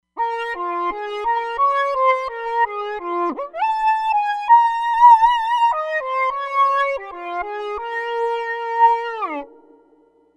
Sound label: Oscillo Lead